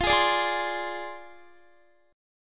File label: F Minor 6th F down Guitar